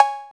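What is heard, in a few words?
808 COWBELL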